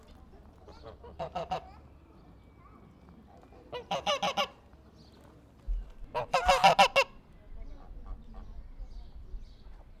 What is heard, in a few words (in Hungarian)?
liba sziszegis szello, parasztudvar; nyiregyhazi zoo 2010